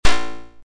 maglock; Robot Wants Fishy

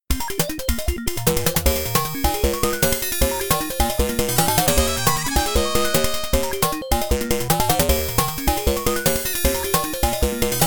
BGM (Faster); Block Block; arcade

